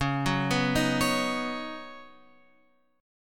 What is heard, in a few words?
C sharp 7th Suspended 2nd Suspended 4th C sharp down Guitar Open F; C#7sus2sus4; arpeggiated